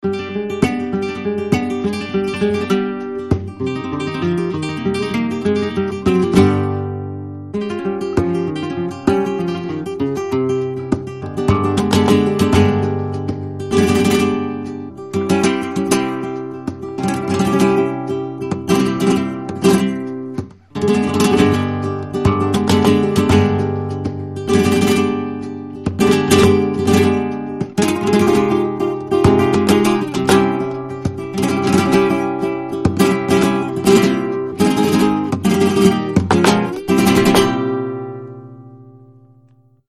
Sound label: Opening Forest Bgm; ps3; gamerip; Shadows of the Damned